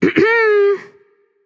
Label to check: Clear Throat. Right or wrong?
Right